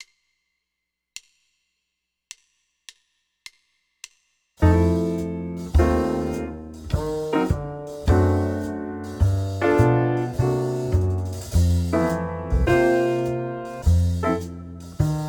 med swing 104 winds, jazz; LMEA All-State Jazz

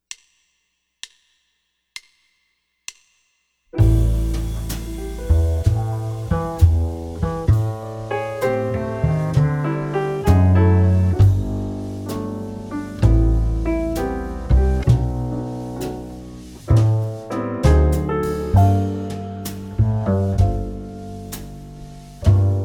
ballad guitar play along, jazz; LMEA All-State Jazz

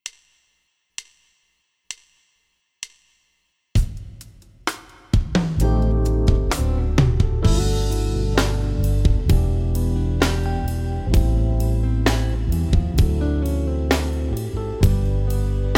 horns play along, smooth ballad, jazz

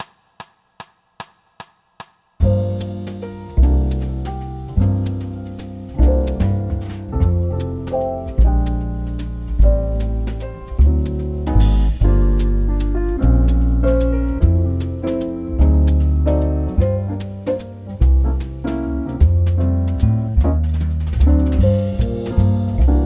guitar play along, waltz, jazz

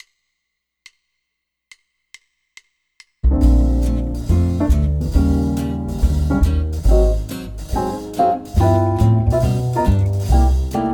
HORNS Play Along SWING

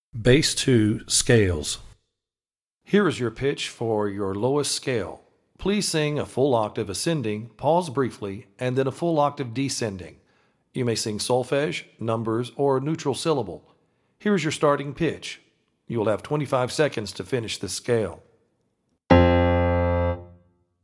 Bass Scale Audition

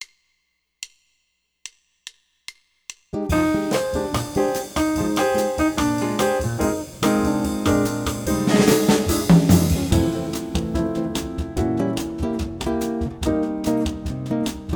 bossa bass play along, jazz; LMEA All-State Jazz

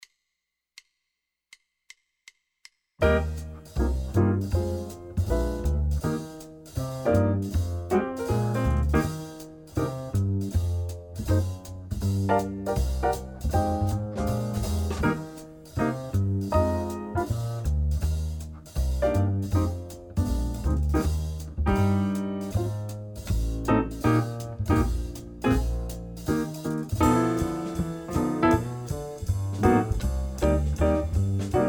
Up Tempo Swing Winds

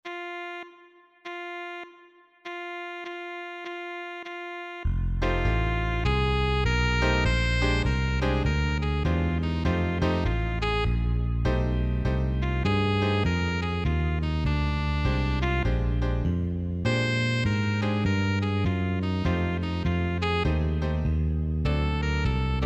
play along drums

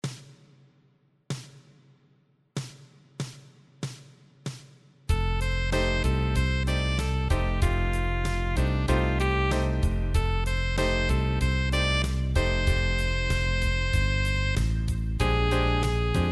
play along guitar

